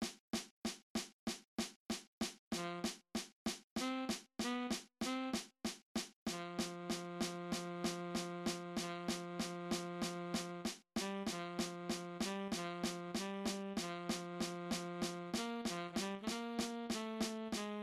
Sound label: cut time tenor sax